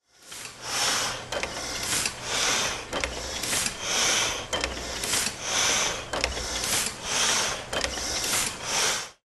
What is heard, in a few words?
Printing Press